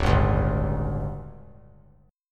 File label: D Major 7th Suspended 4th, chord; DM7sus4; strummed; JGuitar